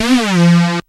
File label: lil rize, synths dance rap